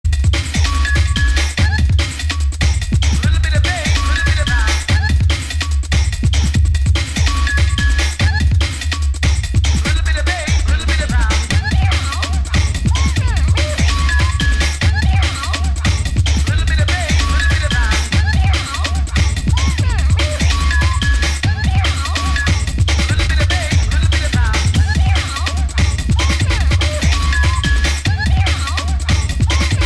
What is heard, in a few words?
Drum & Bass version